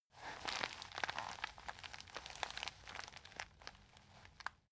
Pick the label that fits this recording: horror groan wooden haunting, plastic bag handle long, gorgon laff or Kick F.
plastic bag handle long